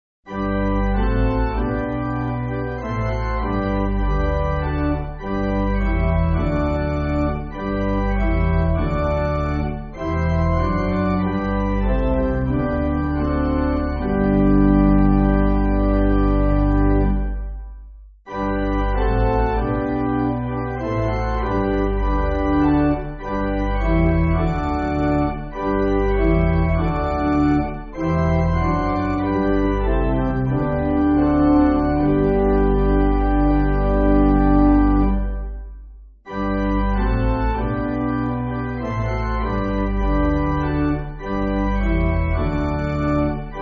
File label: Pipe, church music; Thanet